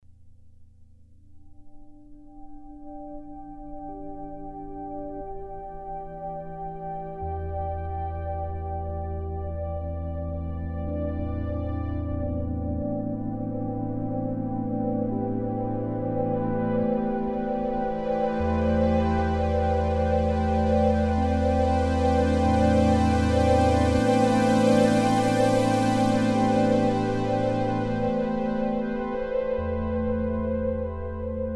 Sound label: Polybrute